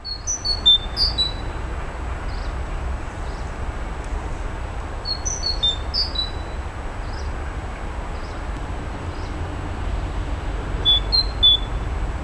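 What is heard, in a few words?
P major atypical song, background Ph tro es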